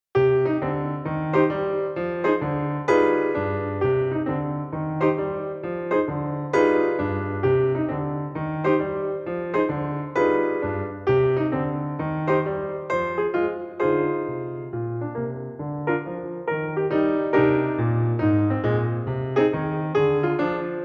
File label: Eb accompaniment accompaniment only